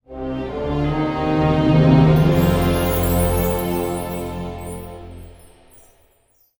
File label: Event Fanfare; game rip; lego worlds monster pack